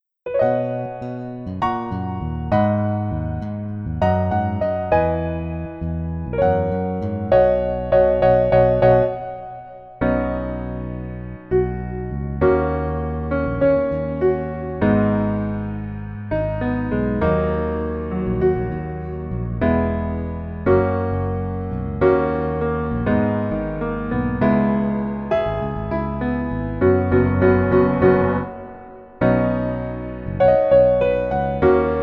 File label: Piano ver